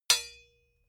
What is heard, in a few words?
sword hit sweetener